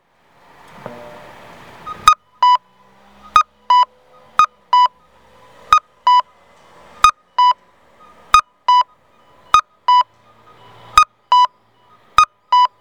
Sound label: kakko, onkyo kosaten; tokushima; miyoshi; nagoya U-TK-1; awagin ikedashiten mae